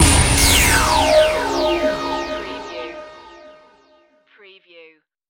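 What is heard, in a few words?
Production Element Hit Sweeper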